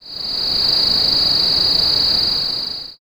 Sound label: night insects